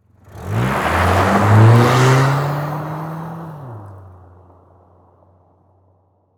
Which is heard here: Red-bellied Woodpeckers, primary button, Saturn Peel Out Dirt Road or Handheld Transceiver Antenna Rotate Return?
Saturn Peel Out Dirt Road